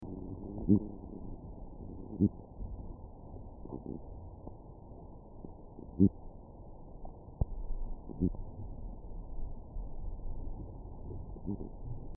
Pseudoplatystoma punctifer fasciatum, Amazon, soniferous; Amazon Fishes